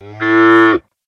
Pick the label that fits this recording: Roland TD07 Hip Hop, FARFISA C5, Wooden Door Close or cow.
cow